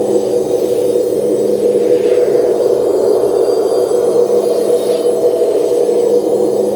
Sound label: spawning beacon loop; LoL